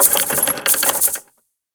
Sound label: fright activation; 摇钱树; ITEMS